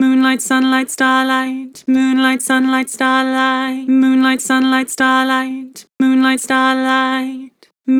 Vibrato Moon sun starlight, Harmor Vocals

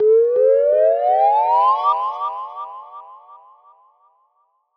FX Sine Rise 125 BPM

